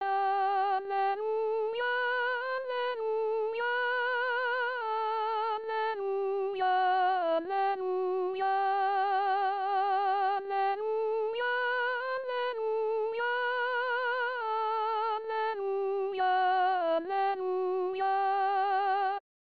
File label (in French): soprano